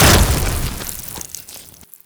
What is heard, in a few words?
rocket blast death